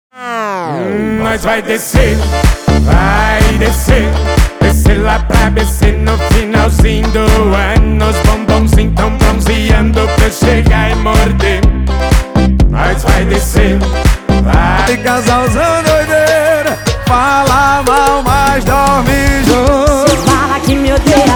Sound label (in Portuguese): Sertanejo